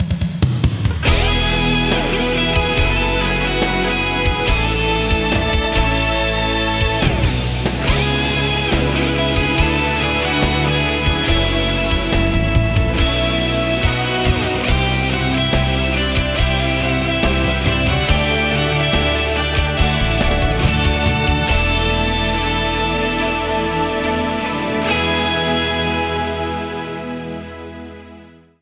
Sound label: Ending; K-1 Revenge; PSX